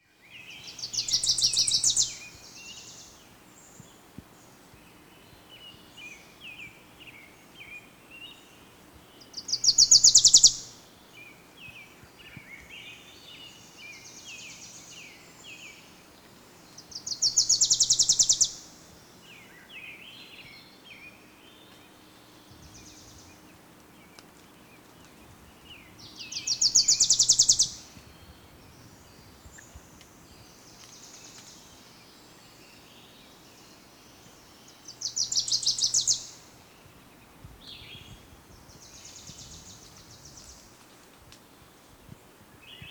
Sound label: Wilson's Warbler; birds